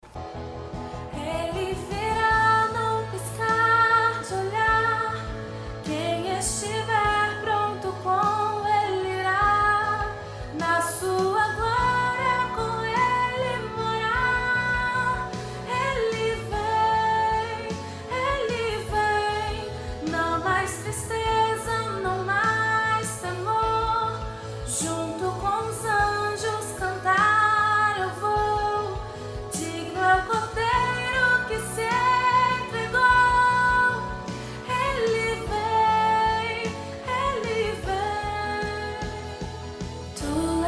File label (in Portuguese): mezzo soprano voz guia